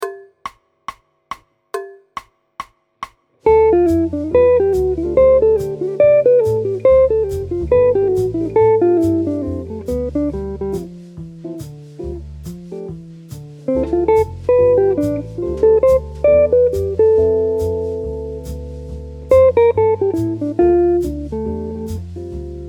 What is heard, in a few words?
Solo Examples, guitar; Jamie Holroyd Guitar